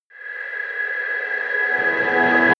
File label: spiritual rising, Risers; whooshes rises